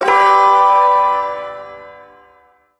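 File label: Shawzin Major Chord; Warframe; Duviri Ersatz Shawzin; Chords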